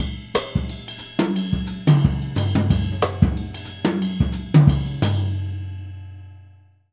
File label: Jazzistic; Univers Sons